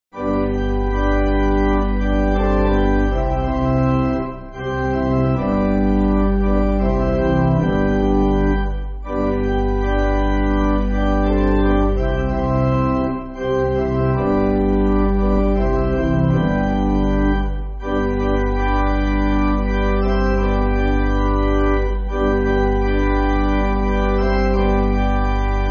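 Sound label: Pipe Organ, church backing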